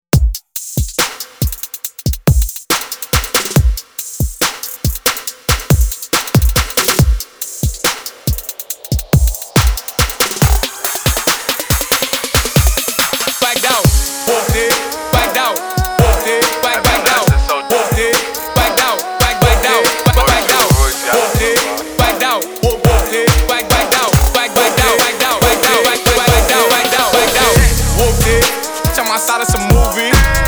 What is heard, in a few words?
bpm 140; funkymix vol 263; kwikmix